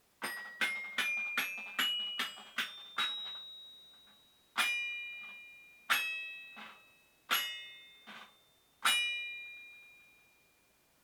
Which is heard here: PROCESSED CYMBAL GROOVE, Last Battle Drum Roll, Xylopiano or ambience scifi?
Xylopiano